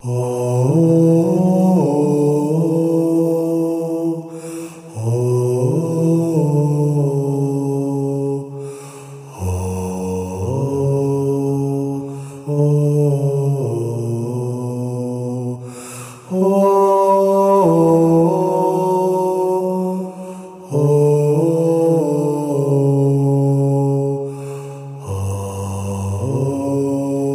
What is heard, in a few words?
interludio gregorian